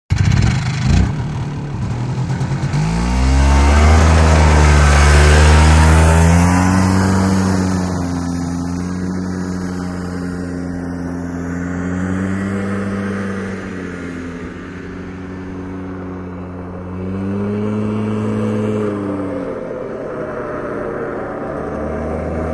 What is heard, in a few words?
Four Wheel Atv Revs Id